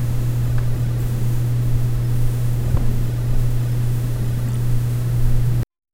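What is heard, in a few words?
ambient silk sheet rustling; indoors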